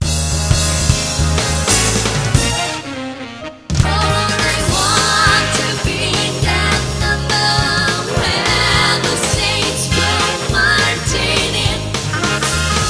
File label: VA-7 variphrase